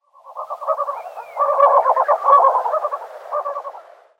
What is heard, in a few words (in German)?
Steppenflughuhn Syrrhaptes paradoxus; Vogelstimmen